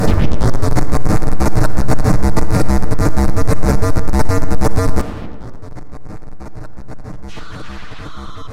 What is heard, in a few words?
Glitch lfo; Dstorsions; Suonho